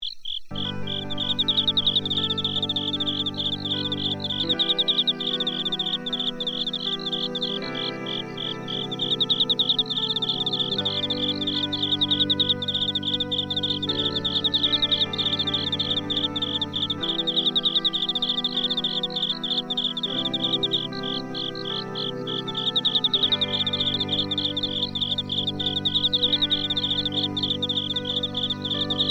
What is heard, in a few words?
Smooth Guitar insects